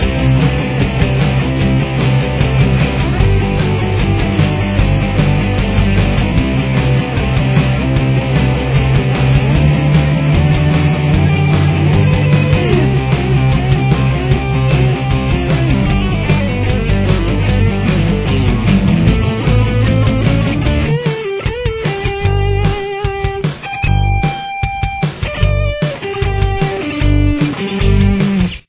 solo

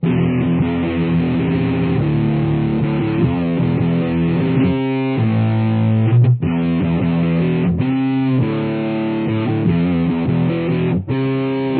prechorus, guitar

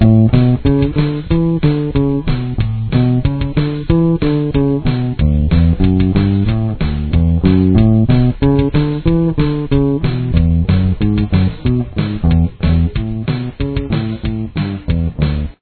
bass

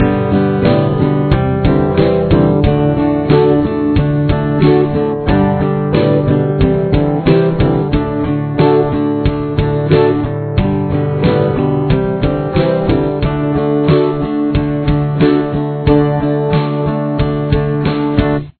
chorus, guitar

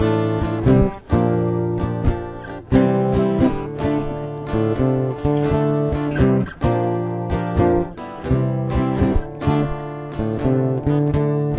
chorus, guitar

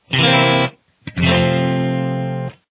c dominant 7th resolution

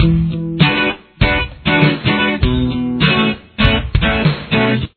chorus, guitar

